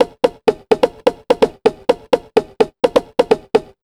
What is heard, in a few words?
127 DRM, Fresh Disco House